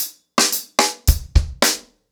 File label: 110BPM, ACOUSTIC DRUMS